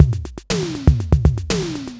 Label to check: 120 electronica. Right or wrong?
Right